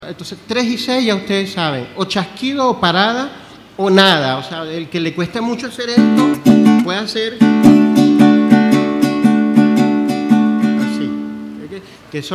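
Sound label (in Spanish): Ritmo Pasaje Conclusion como vals y con maracas, cuatro; Clinica Luis Natera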